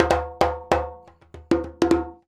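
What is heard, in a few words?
100 JEMB, djembe; Univers Sons; Basicussions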